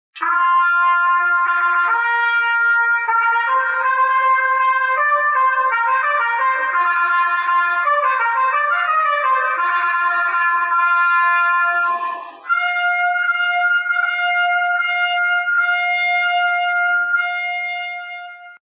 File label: Trumpet entrance fanfare